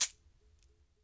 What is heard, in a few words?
Berimbau Shaker, World Percussion; Sony CS